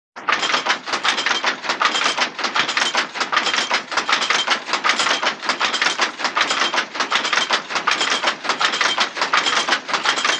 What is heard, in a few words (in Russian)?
Tkacckii stanok